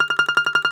DOMRA

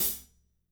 16 HAT, DRY KIT

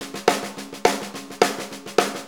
Ballroom Beat, Drum Loops

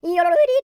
YODEL; ILIO; Vocal Planet

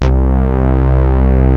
SYNTH BASS, ENSONIQ VFX; Rubber Chicken Software Sample Bank

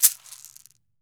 CAXY, CAXIXI; Brazil

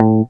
damp GBSN A2, EL.G; AKAI S-Series CD-ROM Sound Library VOL-2

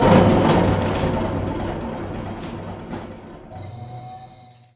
Prepared Piano; Battery 3 Sample Kits

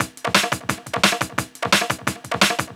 DNB BREAK

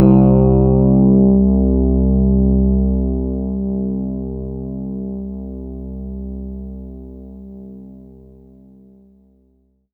C2, Synth Line; 90 s Sample CDs; NiceBeats; SynthLine VOL-23

